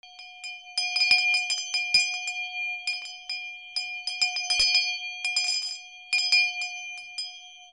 BELLS

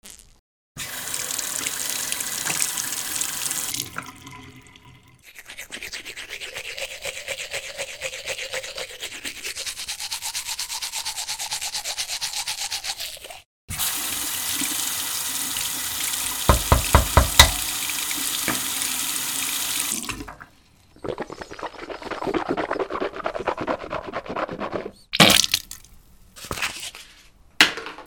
BATHROOM

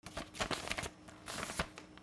OFFICE

